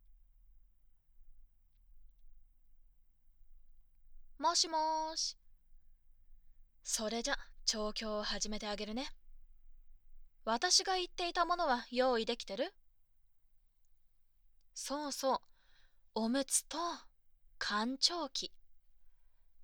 おしっこ 浣腸

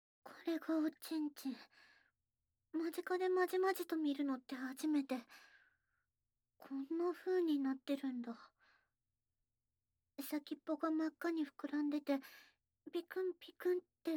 シックスナイン＆後背位＆中出し＆子守唄; これがオチンチン…まじかで見るのって初めて…

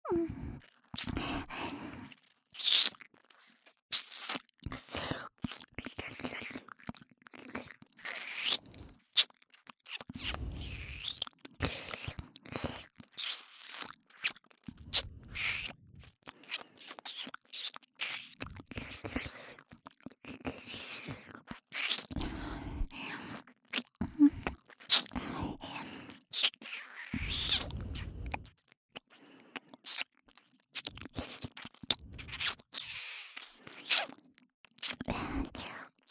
左耳舐めループ, ASMR

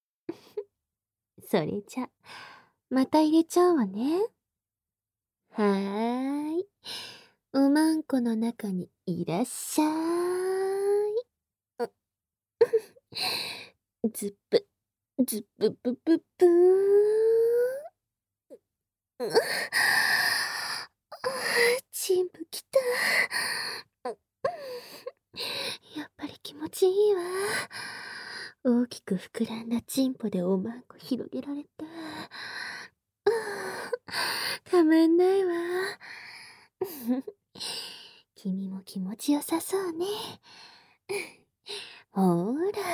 耳舐め乳首責め騎乗位; 耳舐め乳首責め騎乗位でザーメン搾取♪; 効果音なし

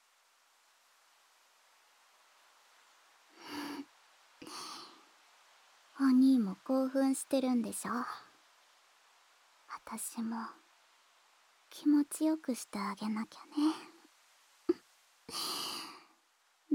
芝生の上でフェラチオ

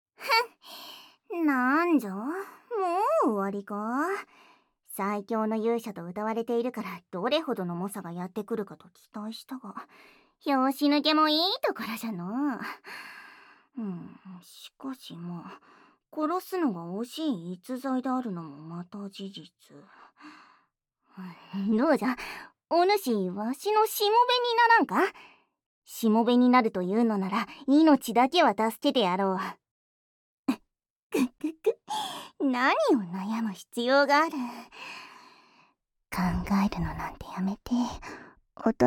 メスガキのじゃロリ 耳舐め, ASMR

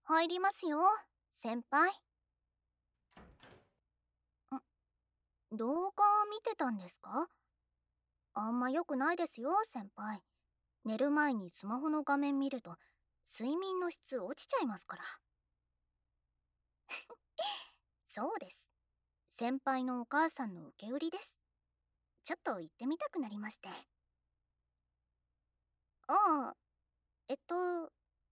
添い寝, ASMR

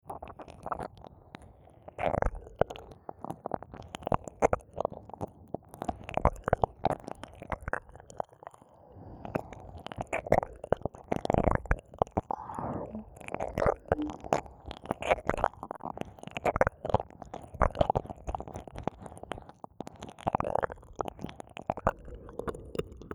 右耳舐めループ; 通常耳舐めver；おまけ

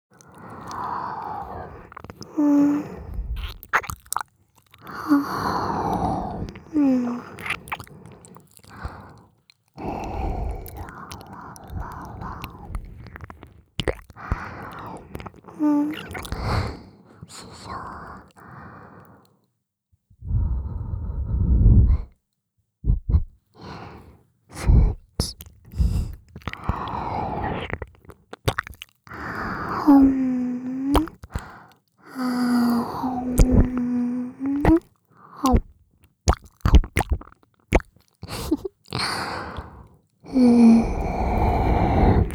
耳舐めオンリートラック; フーカ

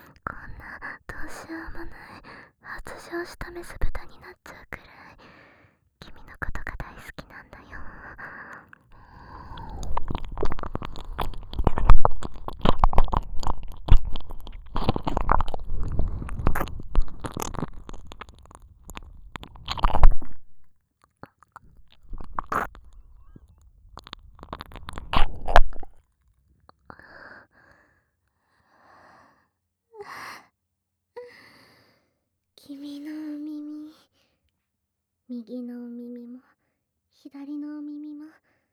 お外で我慢できずにぺろぺろ耳舐め

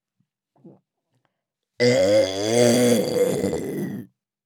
追い打ちザーメンゲップ; ザーメンゲップ集; ASMR

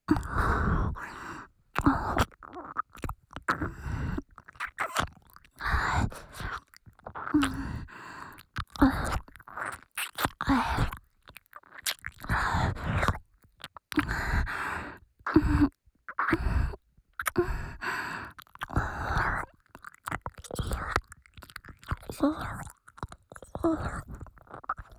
耳舐め（左）舔耳

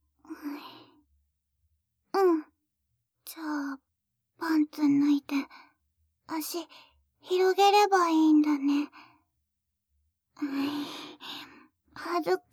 耳舐め 生 セックス

